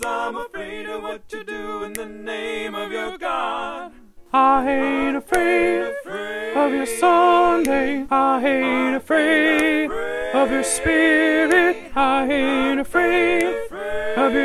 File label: descant alto